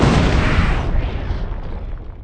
explode; Manic Miners

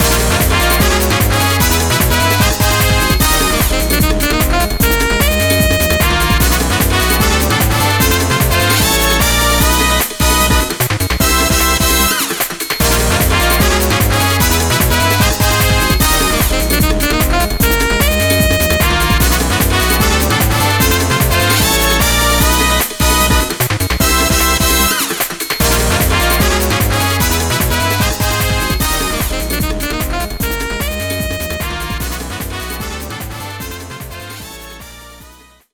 Course Intro; Samba de Amigo; Sonic & All-Stars Racing Transformed - Original Sound Version

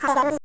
Voice Hum; Princess & Conquest v0.20.06

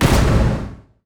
player hit; Drunken Robot Pornography; Inago Rage

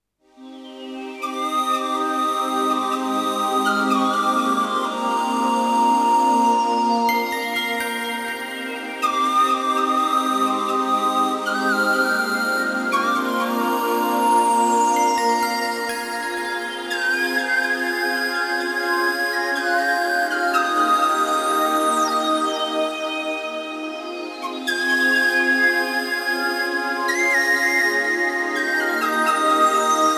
Flute Melody; Vaporwave

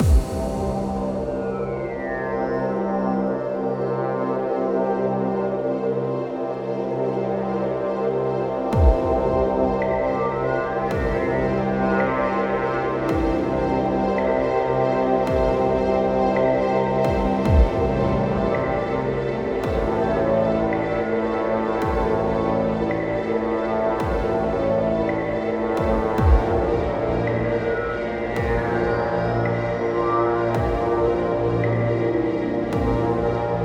Lab Ambience; AM2R; AM2RLauncher

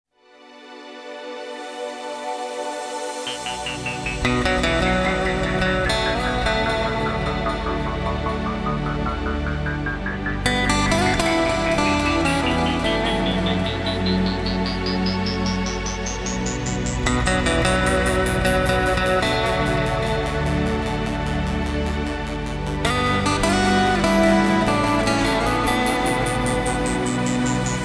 Guitar Melody; Vaporwave

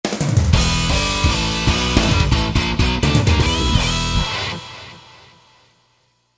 Goal Fanfare; F-Zero X

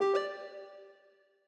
Hardware Insert; Reincarnation of Windows 11 Sounds in fake Longhorn (Samsung Theme for WinXP) style by David Mounder; Longhorn Valley Sound Scheme by David Mounder

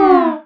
slowdown; Drunken Robot Pornography; Inago Rage

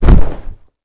power push; Audiosurf; chillout

